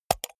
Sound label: computer mouse wheel click fast hard